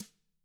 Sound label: TAMA; ILIO; Double Platinum Drums 1